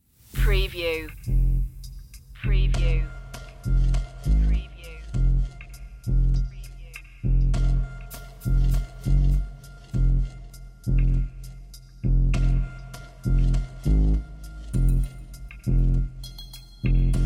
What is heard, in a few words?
bass drums